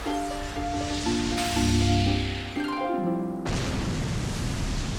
Harp; Hyrule Warriors Definitive Edition; Sheik's Harp

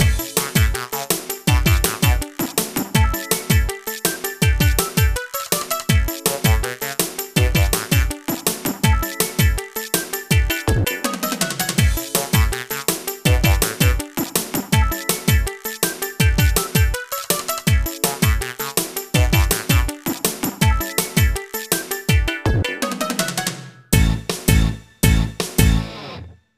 BGM Exercise

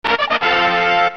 Get Item; Mega Man Legends 2

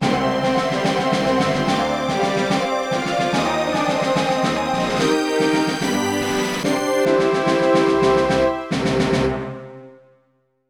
Jingle Success; Star Trek Tactical Assault; NDS

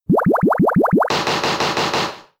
Bubble; Bubble Beam

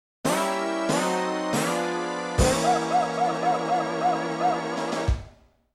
Goal in (5th-8th); Mario Kart 64

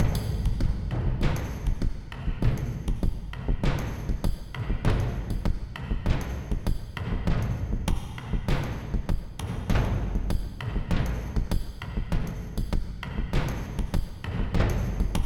Explore Tension Drums Submix; Necropolis

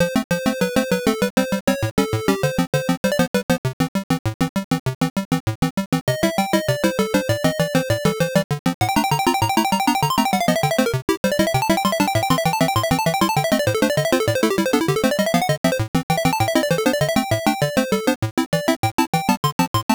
Piano Piece; Maniac Mansion; Tandy 1000

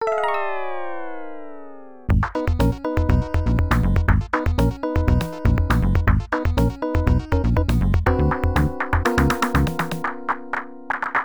Goal; super sprint; atari system 2; arcade

